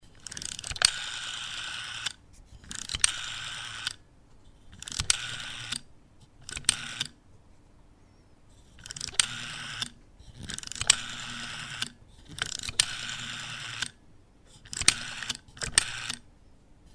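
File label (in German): Telefon Wählscheibe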